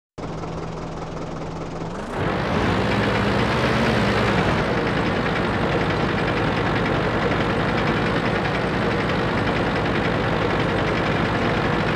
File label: marder moving, tanks